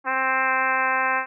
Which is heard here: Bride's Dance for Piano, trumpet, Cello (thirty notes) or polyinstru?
trumpet